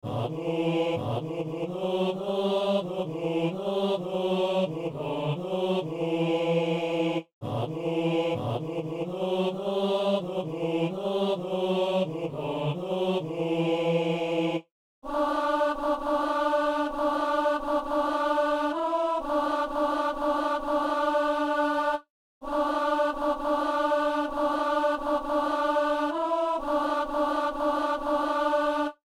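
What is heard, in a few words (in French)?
ténor; Saint-Denis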